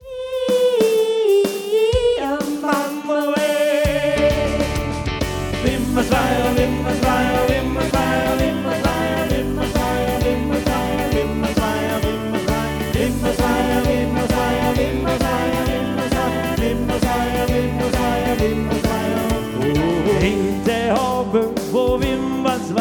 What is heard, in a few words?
Trio; wimmervej